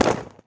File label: pop; TotalImmersionRacing; XBOX